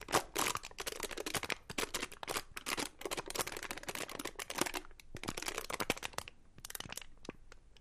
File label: Metal, Foil Crinkle; Foil Lightly Crinkles ( Aluminum Foil )